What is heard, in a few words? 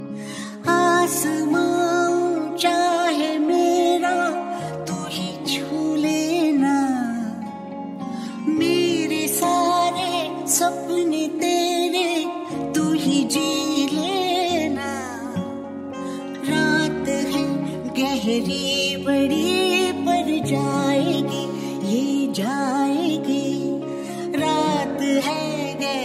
ringtone, bollywood ringtones